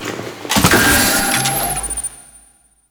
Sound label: Swarm Scanner Pickup; Entrati